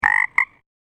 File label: pacific chorus toad; ringtone